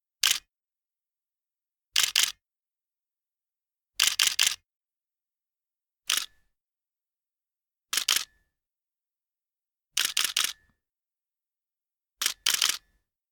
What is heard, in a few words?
Camera Shutter